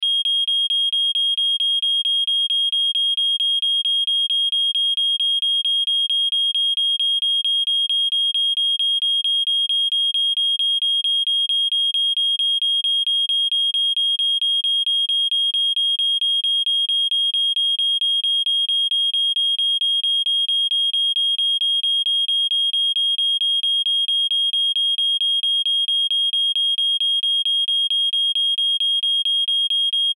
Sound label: Alarm Smoke Detector Fire Loop; Electronics; Household; Blastwave